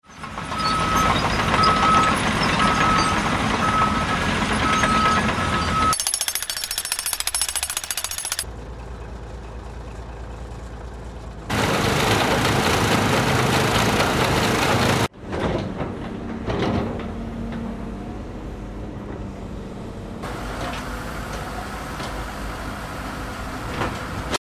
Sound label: construction site sound